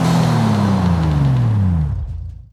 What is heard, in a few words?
throttle off, vehicles; 911s